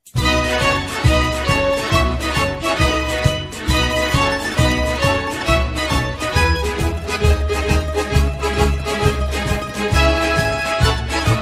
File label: italo pop